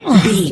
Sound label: voice male b effort quick action